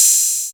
hh open, drums; Playdate SDK; MIDI Player

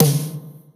Snare Jungle Skitter, drums

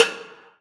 Bell Strike Raged; One Shots; Drums; Ableton Live 12 Lite; Core Library

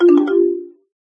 system message; uisounds; There; ThereClient

